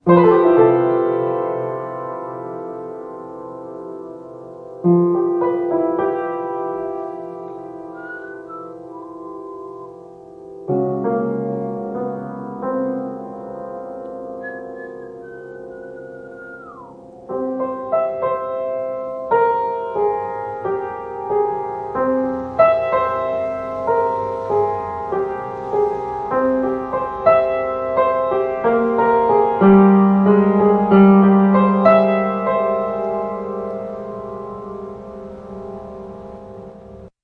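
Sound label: piano whistle; remixable collections; work-sin-progress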